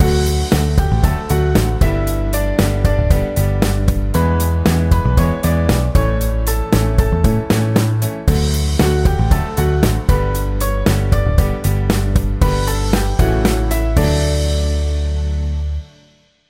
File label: background; Ashampoo Movie Studio; birthday